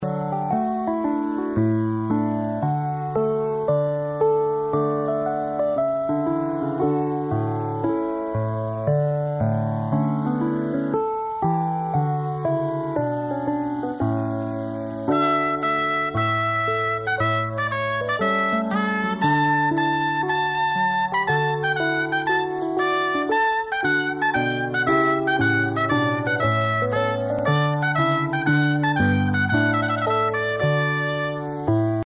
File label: trumpet tune